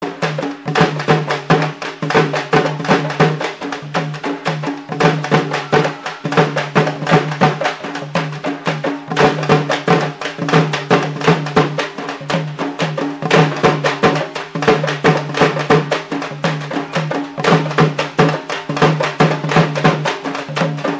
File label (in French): tambours